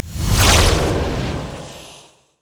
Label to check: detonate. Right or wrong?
Right